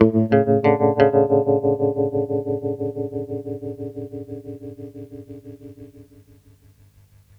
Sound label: PINK2, TREMOLO; USB Soundscan vol.31; Miro s Grooves; AKAI